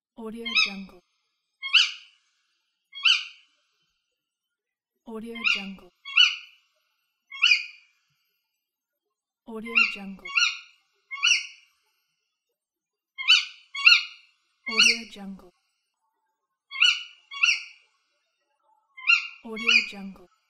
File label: one bird